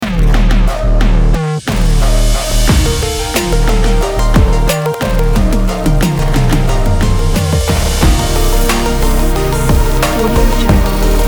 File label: broadcast beat; adigold